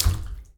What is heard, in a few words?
bathtub step; Golden Crayon Sounds